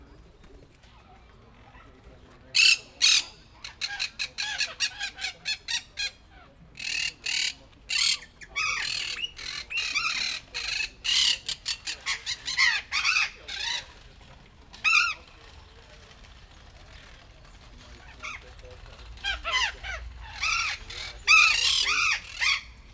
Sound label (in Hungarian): diszkreten, papagajok; debreceni zoo 2019